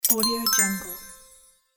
open achievement

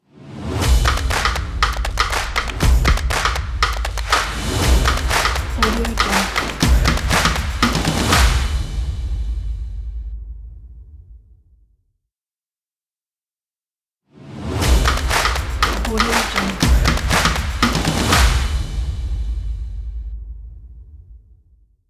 stomp percussion upbeat logo

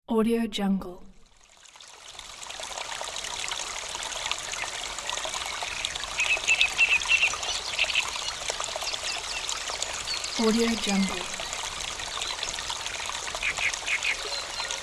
river in forest

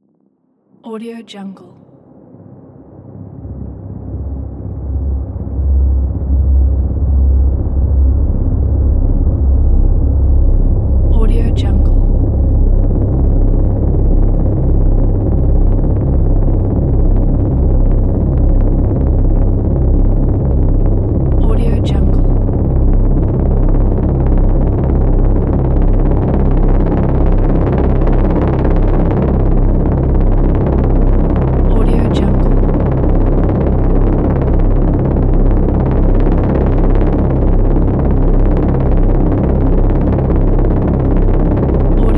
dark suspense drone

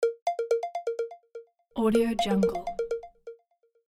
simple incoming video call

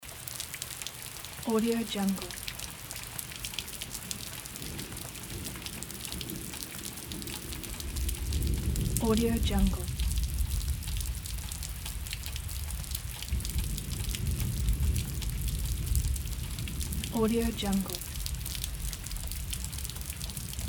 rain with distant thunder loop

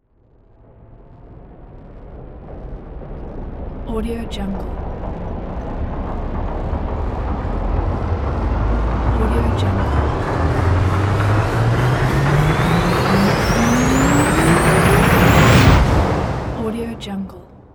the spaceship start; phillipmariani

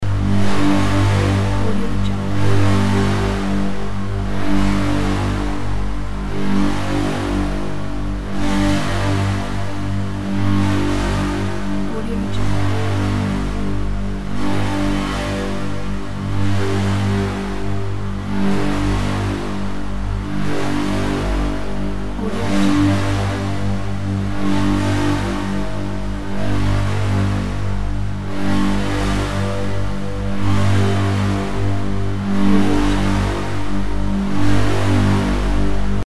teleport emitter

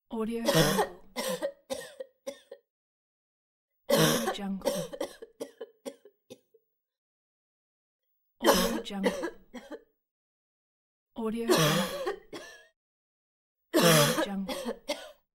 ough female

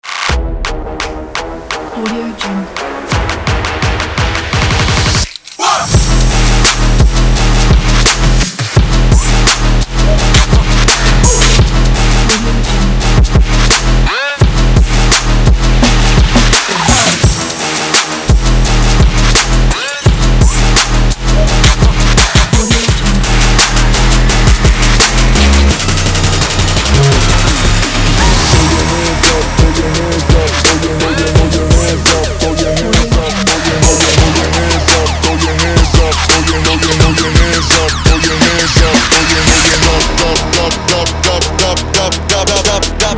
that trap

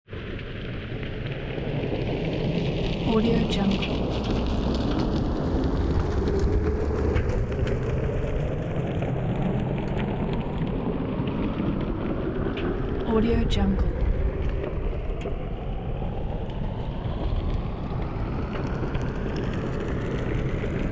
giant vine fall swoosh constant

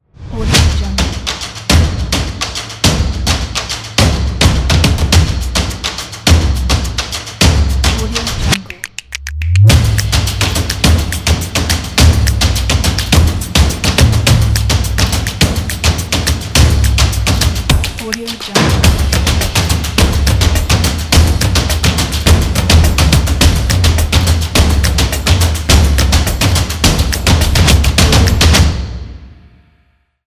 drums action

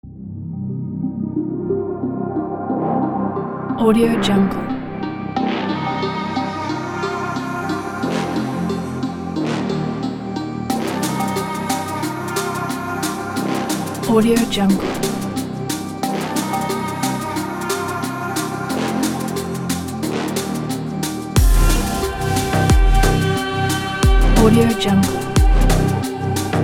dark g house; soundkick

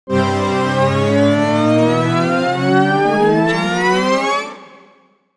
electricity electronics charge

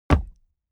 foley step walk wood plank

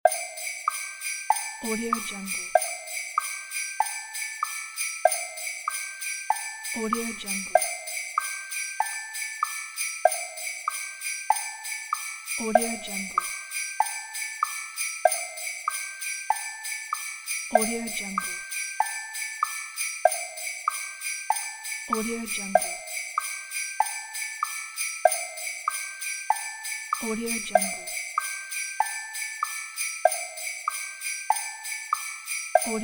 christmas jingle bells wood block bed

